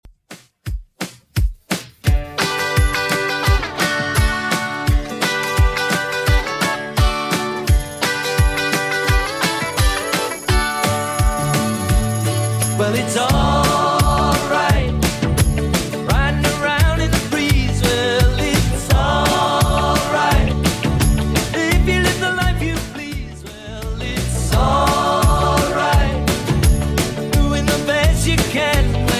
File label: 80s Redrum 171bpm